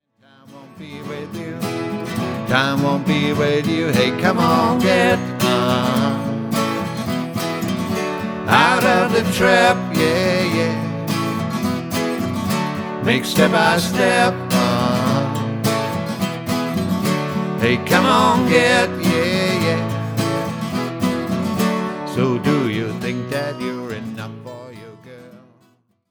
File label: Trap